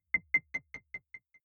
button echo distorted

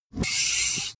pneumatic close

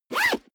zipper backpack close fast

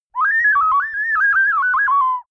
ocarina randomness short

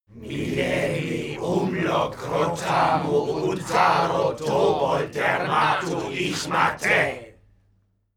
male crowd evoke

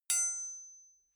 knife hit metal ring

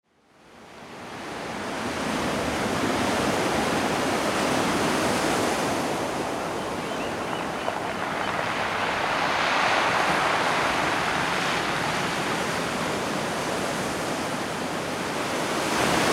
ocean beach in oregon

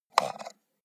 computer mouse wheel scroll fast

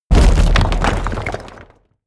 break stone medium

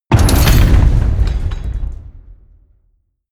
gate slam medium

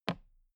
toolbox handle bounce soft

